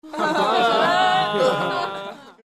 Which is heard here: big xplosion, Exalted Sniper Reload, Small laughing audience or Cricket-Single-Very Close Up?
Small laughing audience